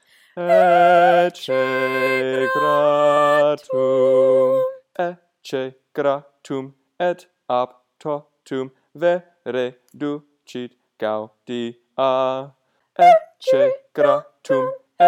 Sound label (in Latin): ecce Gratum Sung; latin